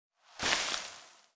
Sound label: die